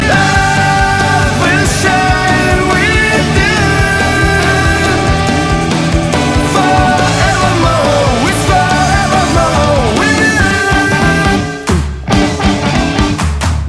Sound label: Fool Groove